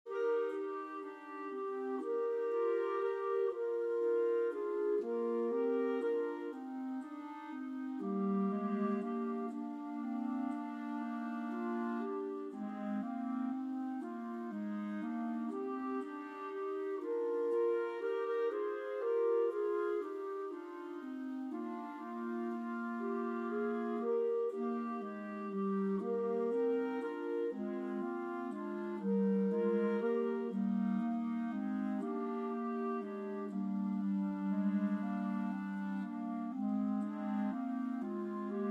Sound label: Clarinet, Concert E-Flat Major